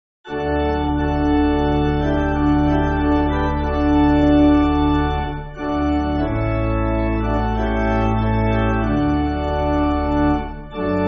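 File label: Pipe Organ, church music; O World The Holy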